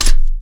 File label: pickup alliance rifle